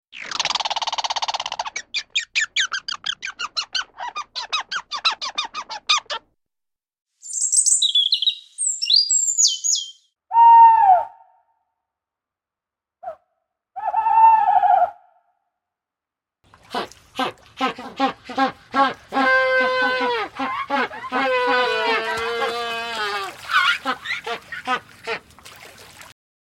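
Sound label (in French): son animaux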